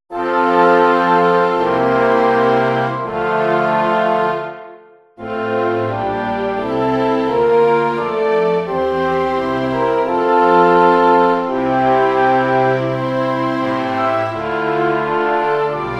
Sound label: Orch; zauberflote